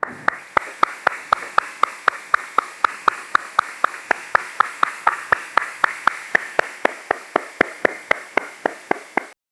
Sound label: plapping sound